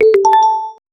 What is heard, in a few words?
question appears message; appearing